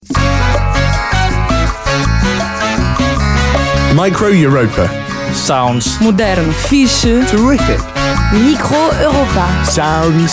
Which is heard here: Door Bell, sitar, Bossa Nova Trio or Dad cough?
Bossa Nova Trio